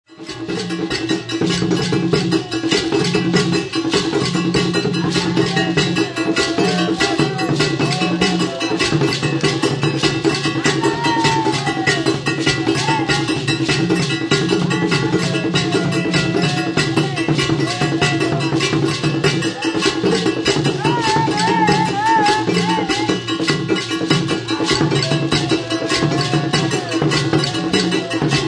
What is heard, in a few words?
petia, ashanti, ghana